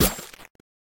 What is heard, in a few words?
Hand Kick; Game rip; MiSide